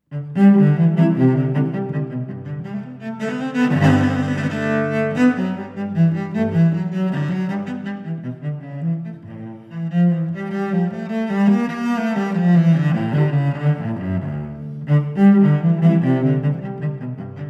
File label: Cello Suite 1 In G